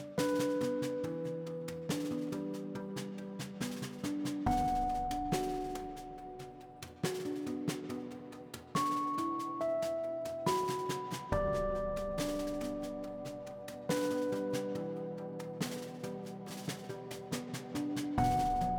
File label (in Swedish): PIANOT; Unravel Two